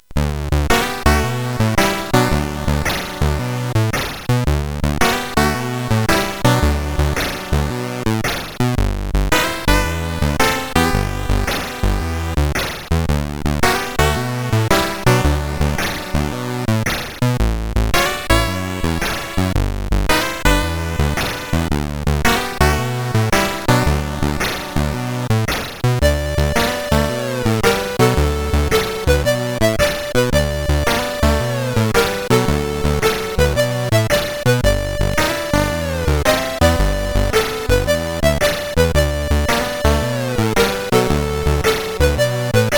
Synth Sample, CSG8580R5; hvsc

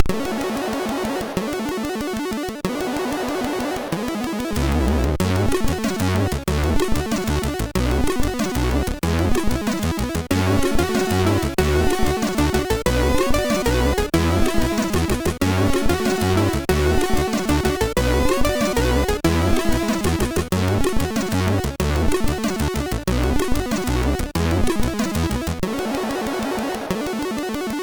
Slowdown sid MOS6581R3

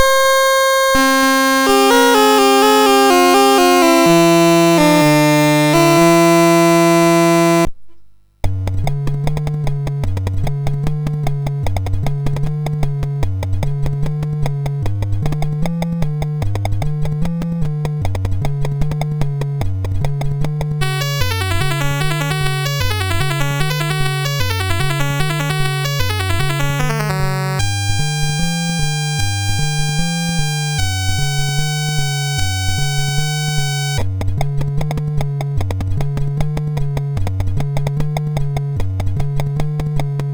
Rolling Thunder, MOS6581R2

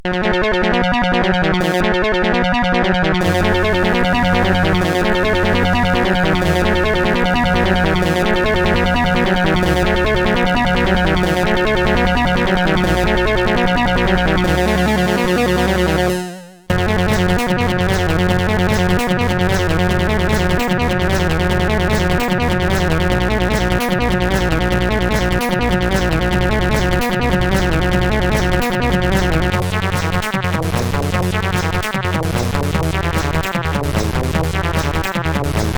Chilling Wind, CSG8580R5; Ahz The Demon

